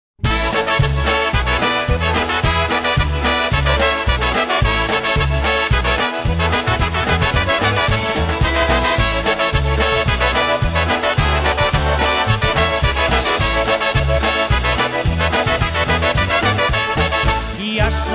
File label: HIGH SHOES POLKA